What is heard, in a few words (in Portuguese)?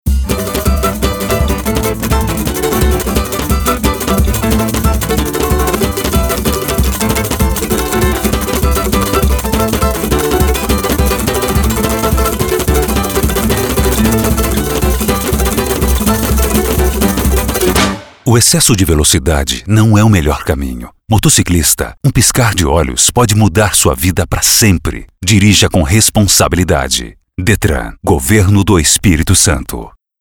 Motociclistas Samba sem batida; Detran